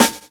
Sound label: crunchy snare sample a sharp key; snare drum samples